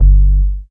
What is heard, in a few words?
G2, BASS; Q Up Arts; Dream Experience; BASS BANK 7